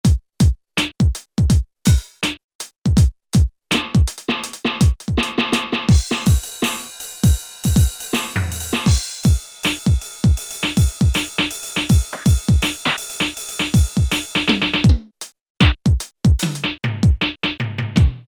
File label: Loop Kit, Alesis Z2 EuroDance